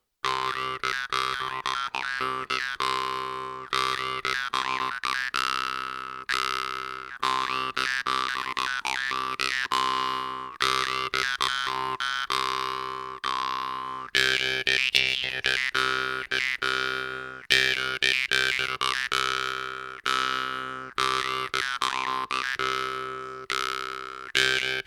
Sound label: estampie; La septime estampie